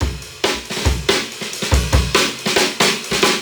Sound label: Riddim Drum Fill 140